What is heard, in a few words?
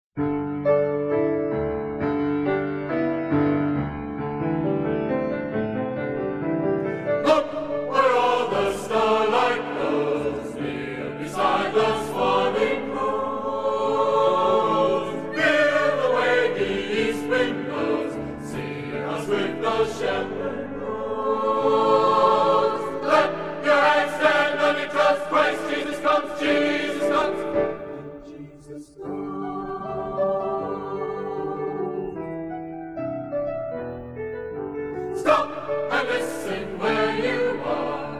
Christmas Chorale; USC Chanteclairs